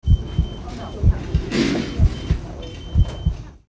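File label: hospital, buildings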